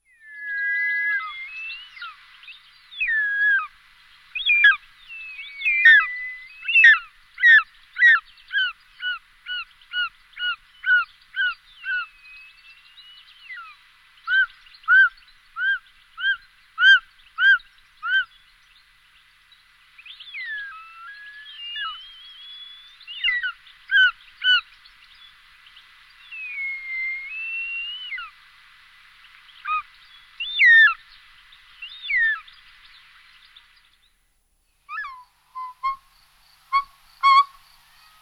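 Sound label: zmeeyad golos, short-toed snake eagle voice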